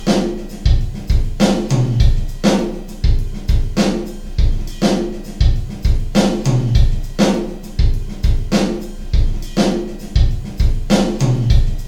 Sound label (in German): drum ohne ams